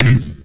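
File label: ARTSH HYBR, percussion metallic; Rarefaction; Poke in The Ear With A Sharp Stick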